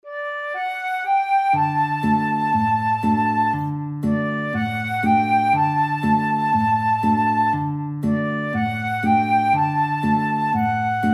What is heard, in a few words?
when the saints FL GUIT duet, guitar flute duet